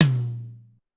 Tom Mid Lo, Drum Machines, Clavia DDrum AT, Pitched Up; 300 Drum Machines